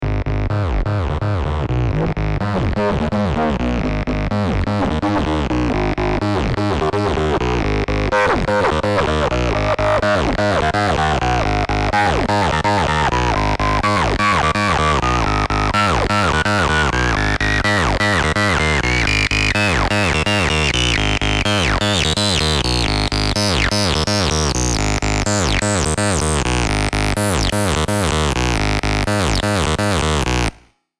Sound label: nasal acid